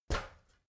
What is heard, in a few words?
step ladder metal single; schritte